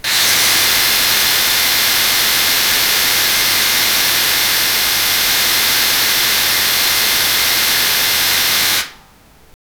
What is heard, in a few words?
electric shock